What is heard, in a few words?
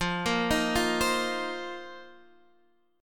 F 7th Suspended 4th, Guitar DADGAD